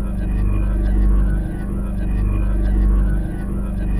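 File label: G1 X-REVERSE; NorthStar - The Wizard VOL-2; X-ON X-OFF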